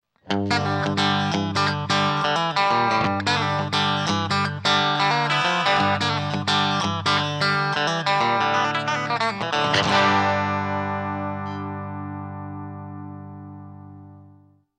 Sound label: MAXON CP9PRO+ Bypass